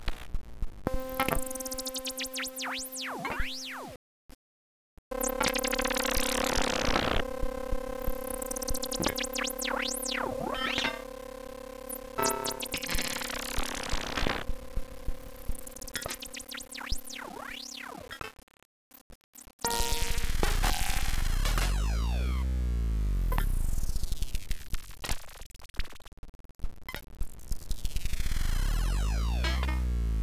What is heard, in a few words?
hose